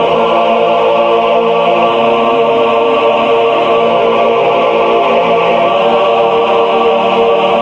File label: voice; Sad